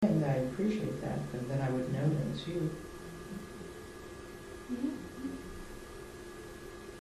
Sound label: humming